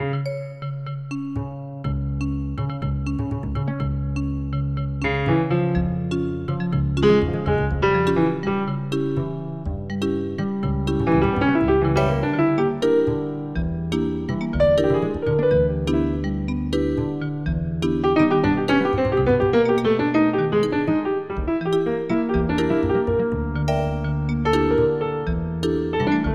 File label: trio, for piano, bass and vibes; impro; first try of midichord